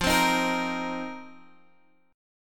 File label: F 7th Suspended 4th F down Guitar Open G